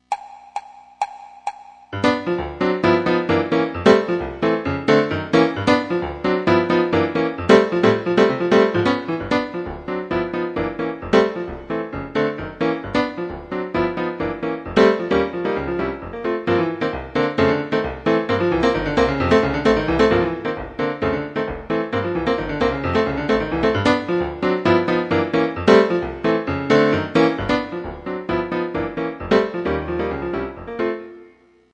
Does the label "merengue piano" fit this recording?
Yes